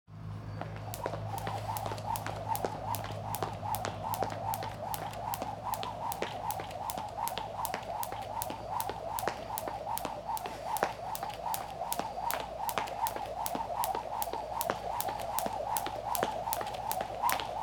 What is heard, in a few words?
Skipping Rope Gym Training; Felix Blume